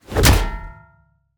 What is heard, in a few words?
punch shield